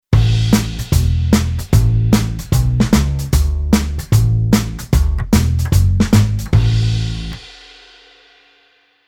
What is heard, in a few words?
Bass Country